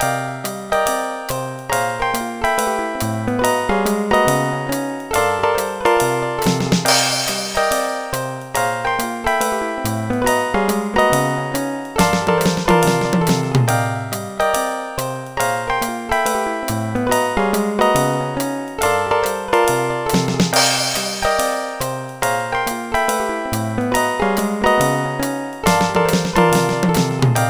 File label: solo; Dr. T's MIDI Recording Studio